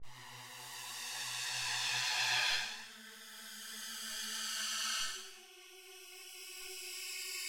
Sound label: Creepy Amb